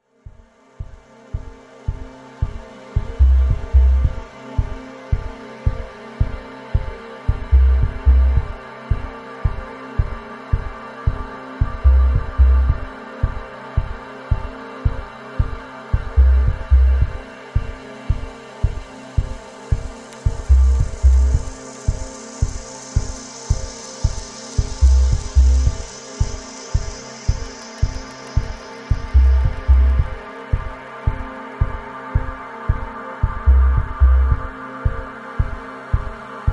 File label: Drone 37Hz